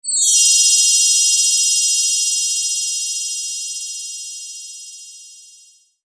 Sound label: Tinkerbell Flying Magic